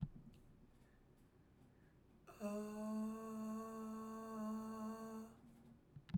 A3 head, Head Voice

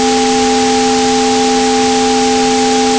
mix Sinusoid

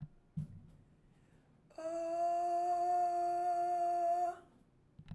F4 head, Head Voice

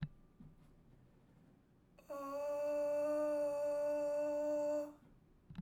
D4 head, Head Voice, singing

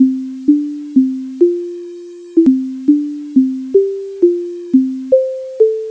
induced Note

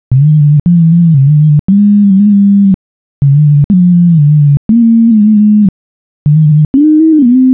query Pitch With Rest

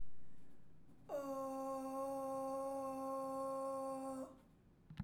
C4 head, Head Voice, singing

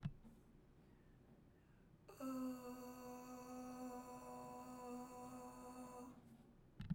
B3 head, Head Voice, singing

